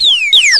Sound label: SILLY WHS